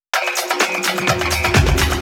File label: Fill 128 BPM; Vengeance Samples; VEH1 Loops